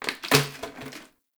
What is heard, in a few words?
Janitor Plastic Bottle Water Drop; Warframe; Ambience; NineteenNinetyNine